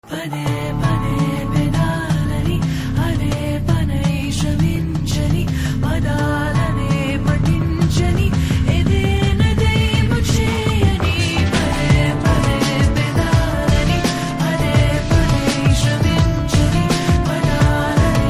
Telugu Ringtone

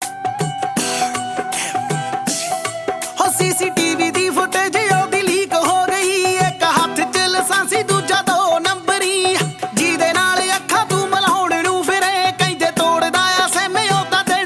Desi Punjabi Ringtone

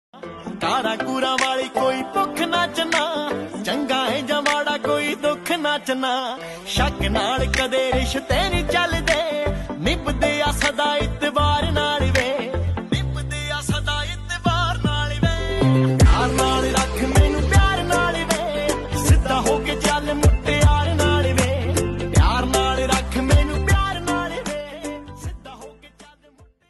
Desi Punjabi Ringtone

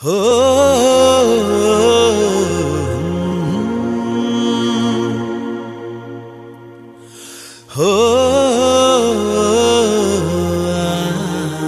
Theme Telugu Ringtone

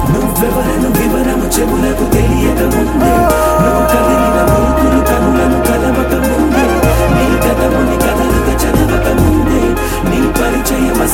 BG Telugu Ringtone

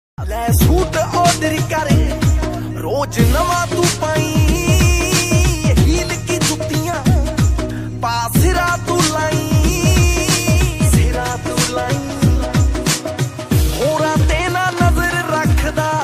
Trending Punjabi Ringtone

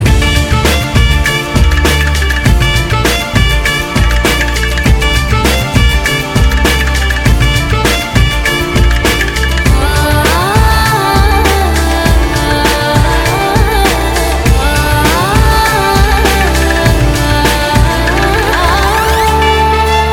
Musical Tone Ringtone; Tamil Movie

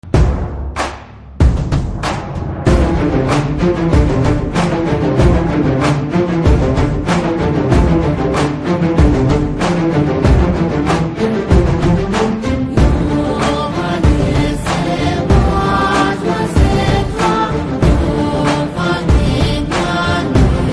BGM Telugu Ringtone; Sita

